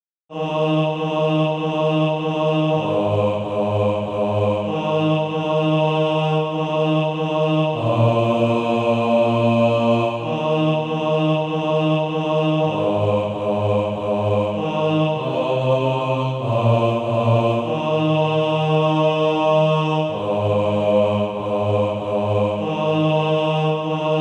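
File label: bass, Practise Music; Footprints of Jesus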